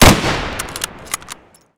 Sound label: sniper; WeaponSound